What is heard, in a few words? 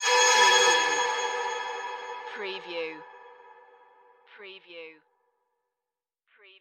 Metallic